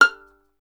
STR VLN, Solo Instruments; Miroslav Vitous

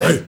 HEY HEY E, CH.SHOUT2; Heart of Africa; Spectrasonics; Heart of Africa 1

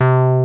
303 MEL, BASSES; Pro-Rec - Synthsonic

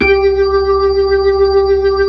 B-3, Blues; Keyboards of The 60's and 70's

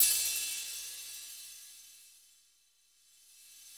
CYM 15, Brush Kit; Club-50 - Foundations Roland; Brush Kits

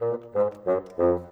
Bassoon, Orchestral; Sonic Foundry (Sony Creative Software); Orchestral VOL-2; Modern 07

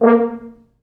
4 FrHorns; A#2; East West; Quantum Leap Horns Sax; 4 FrHorns MOD

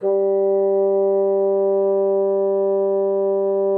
bassoon, G3; Xsample VOL-6

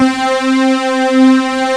D50 OBX FAT, ROLAND D-50; Rubber Chicken Software Sample Bank

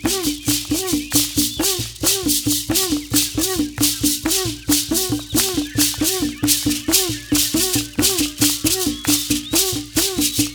RITUAL WHIS, RITUALDNC; Spectrasonics; Heart of Africa 2

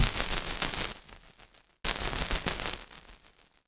Rythmic Noise 130BPM

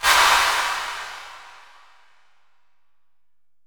808 CLP, 480L; Wizoo; Platinum 24 Electronic

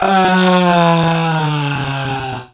VOC, Drum & Bass; Ueberschall; Drum & Bass Flash Trackz

